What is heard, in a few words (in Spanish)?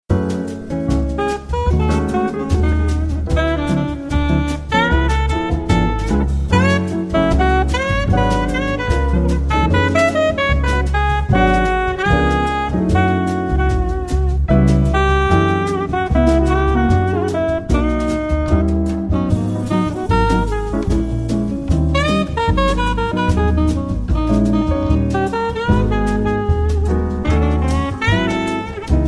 Musica Jazz Instrumental